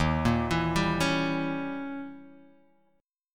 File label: Eb 7th Flat 9th, Guitar Open G; 1,3,2,0,2,x